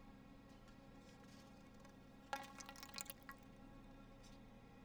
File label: quiet sound